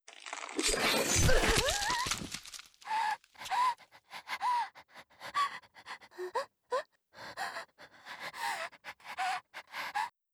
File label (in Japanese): 足を刺す